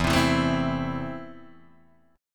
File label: A Minor E